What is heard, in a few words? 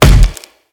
impact crunch; Smite; Cabrakan